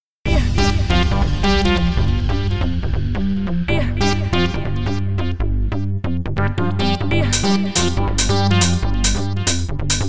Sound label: SPEED TRANCE; Beatmania IIDX 8th Style